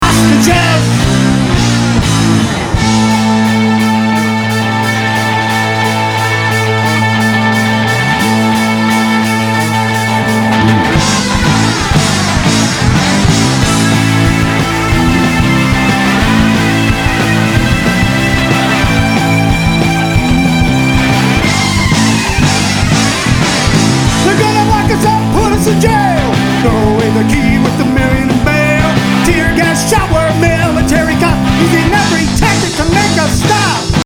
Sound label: step fx unstable tune